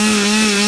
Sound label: Panic, Engine